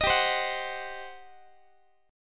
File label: G Major 7th Suspended 4th Sharp 5th, G, down, Guitar; GM7sus4#5; strummed; x,x,18,17,16,17